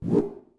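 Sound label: cow attack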